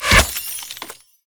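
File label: frost shot on hit; LoL; ashe skin06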